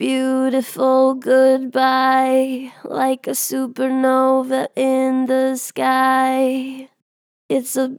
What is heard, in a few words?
Vib Beautiful goodbye, Vocals, Natural Vibrato Strong; Harmor Vocals